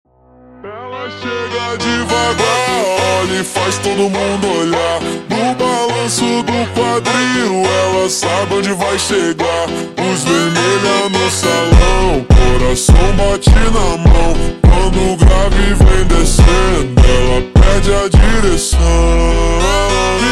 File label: Funk ultra Slowed